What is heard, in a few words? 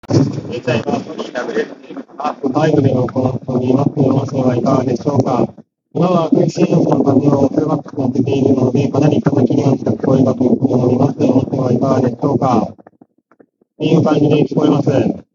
SONY WF 1000XM5 wind